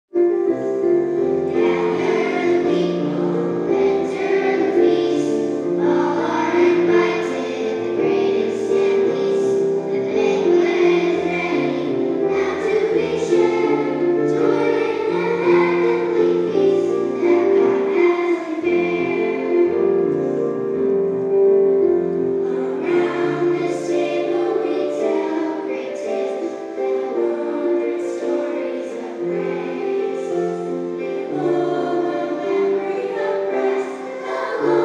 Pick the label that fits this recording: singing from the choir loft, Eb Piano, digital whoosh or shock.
singing from the choir loft